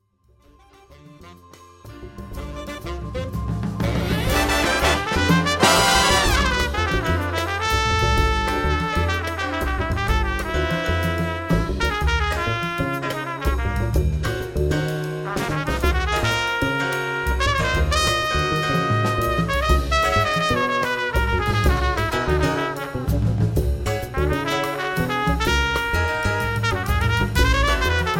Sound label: Aero Groove